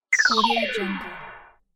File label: transition sound